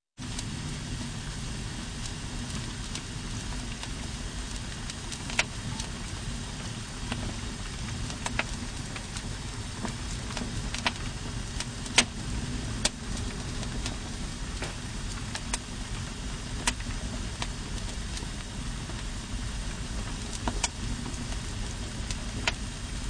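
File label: Whistle, hum of flame in a furnace; Гудение пламени в печи или камине; Звук костра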